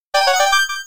red coin, red coins have a slightly different pitch as you collect more; Super Mario 64; Mario Coin Sound Clips